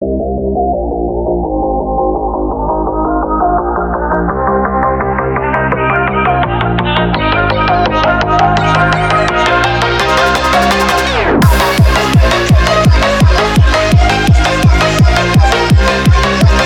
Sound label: phonk speed up